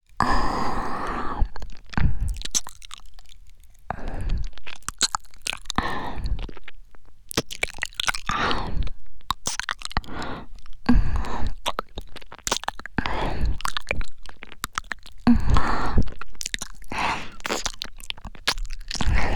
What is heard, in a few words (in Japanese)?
耳舐め 左